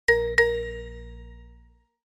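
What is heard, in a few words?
original ringtones and notifications Nokia Asha 302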